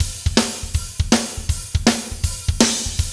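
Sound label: fast shuffle, Roland drum beats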